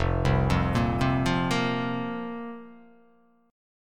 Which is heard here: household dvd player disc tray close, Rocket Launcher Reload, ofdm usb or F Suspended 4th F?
F Suspended 4th F